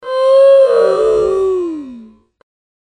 oof long

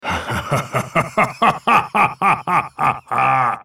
laugh; volibear

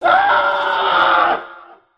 scream

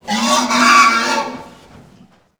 pig chase; A Machine for Pigs

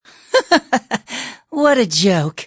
taunt; Adachi

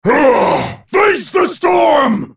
ultimate effort; Volibear

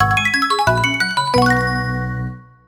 craft, items

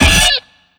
minion explo death; Attack of the Radioactive Thing

